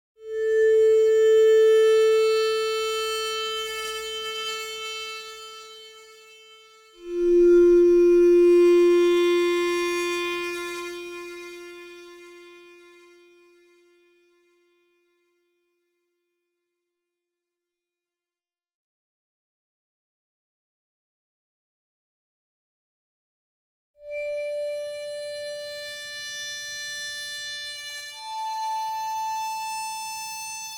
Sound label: ambient; Factorio - Space Age; aquilo; Aquilo 7